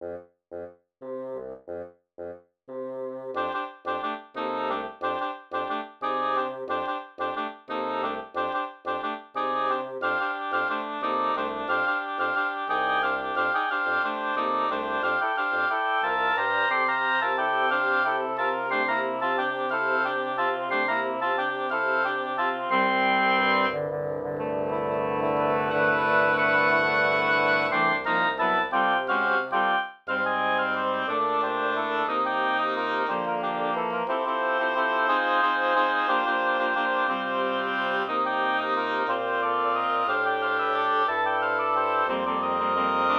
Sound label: waltz